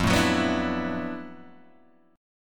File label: D 6th Add 9th F, Guitar, Standard; 1,0,4,4,3,0; strummed; JGuitar